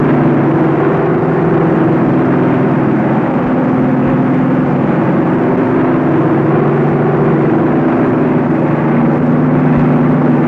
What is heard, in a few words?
B-29 Constant Close Up Interior, Loop Head To Tail